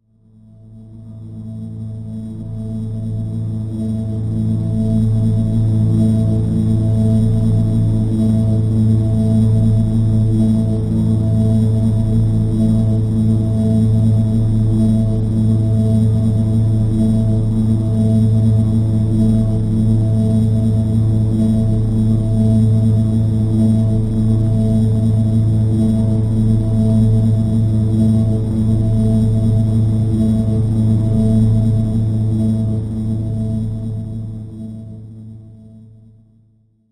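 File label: drone; Neutrality A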